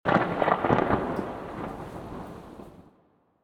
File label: thunder; Funkin